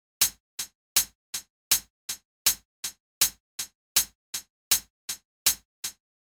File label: hihat 8 beat